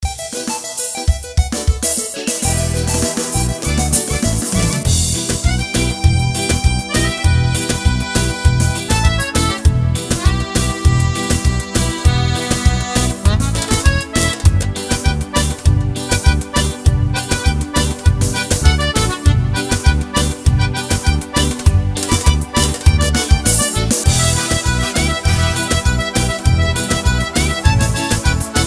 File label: ethnic dance; AjamSonic